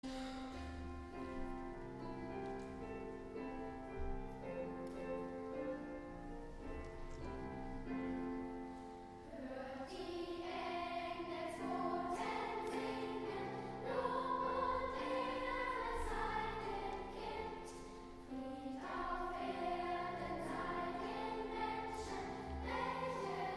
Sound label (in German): Unterstufenchor - Hoert die Engelsboten singen